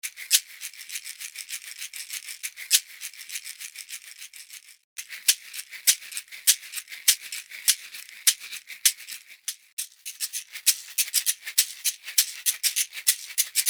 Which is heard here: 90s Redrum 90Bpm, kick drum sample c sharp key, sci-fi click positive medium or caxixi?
caxixi